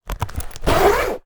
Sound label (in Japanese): チャックの音 革ジャケット その2